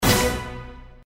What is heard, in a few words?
farm scatter; games; Farm Mania; Happy Farm